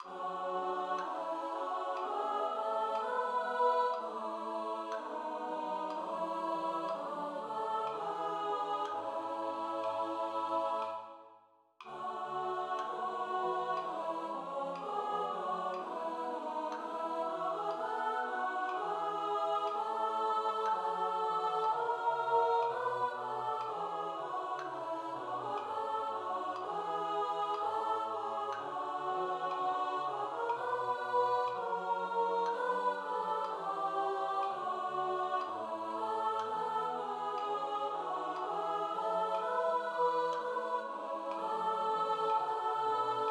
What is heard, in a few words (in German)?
Dein Glanz Alt; Kantorei